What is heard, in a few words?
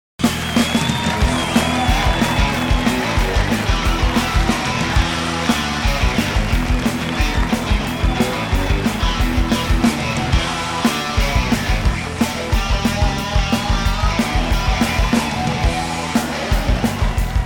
B Rockr GUIT